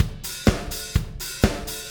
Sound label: 125 Disco Drums, Fast Tempo; Pop Rock; Digidesign; Pro Tools; Pro Tools Essential Loops